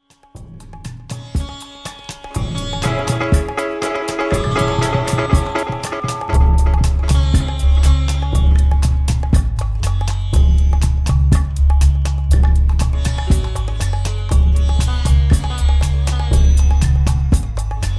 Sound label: Instrumental Healing